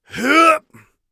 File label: hup, Warrior Voice; Warrior Voice Pack